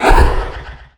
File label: impetus; weapons; dota; enchantress